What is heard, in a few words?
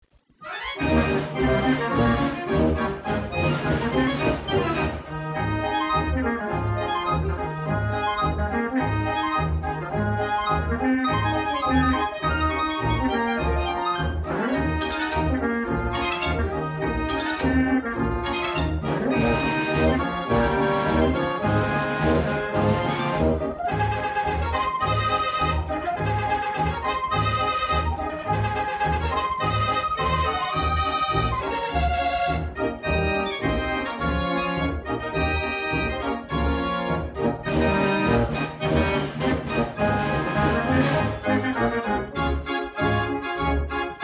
BELGIAN, band organ